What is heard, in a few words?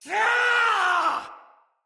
Battle Cry, Soldier Male; Barks; Dialog; Dendrit